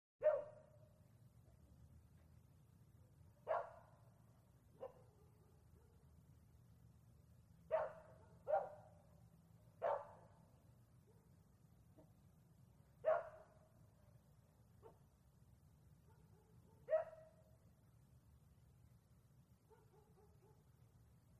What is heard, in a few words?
DISTANT BARK NEAR FAR AT NIGHT; wildlife sounds